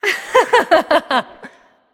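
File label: heh heh, female; smite; voicepack; hera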